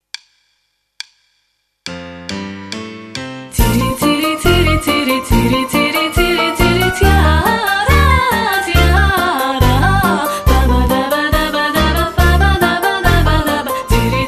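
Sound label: raspevka Dzhazovaya raspevka, jazz vocal warm-up; TILI TILI DYAAA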